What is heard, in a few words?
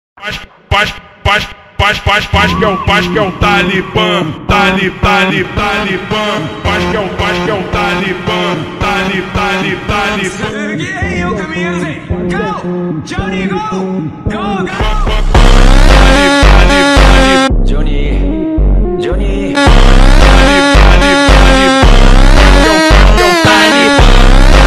Slide melodica slowed version